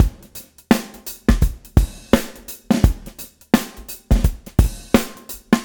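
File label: swing, swing shift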